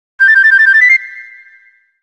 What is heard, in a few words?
Collect Red Feather; Banjo-Kazooie